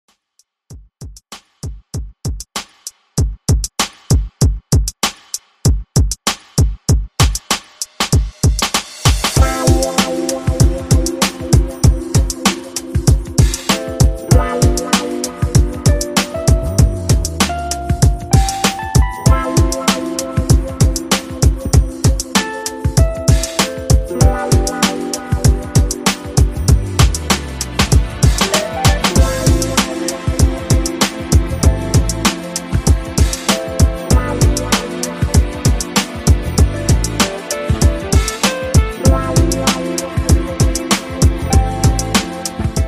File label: 90s Redrum, 97 Bpm